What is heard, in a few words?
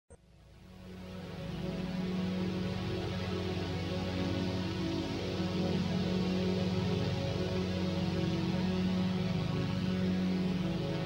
blues rain version; blade runner